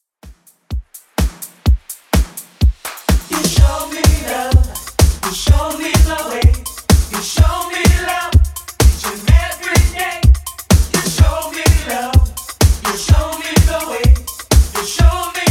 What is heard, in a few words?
80s Redrum 126bpm